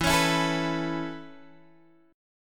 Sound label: A Major Add 9th E Guitar Standard, chord; Aadd9/E strummed; JGuitar